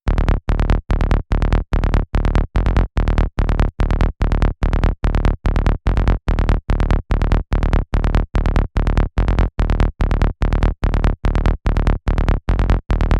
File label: Bass Dark, PsyTrance; LiLA'c Records PsyTrance Sounds 01